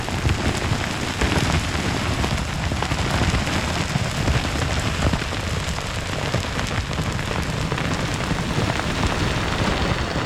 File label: dirt slide loop; teardown; ps5 windows xbox series xs